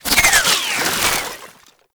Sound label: Infested Spore Pistol Mech React; Weapons